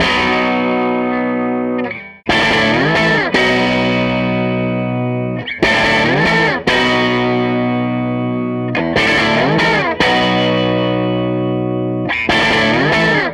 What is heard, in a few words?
SG Guitar Loop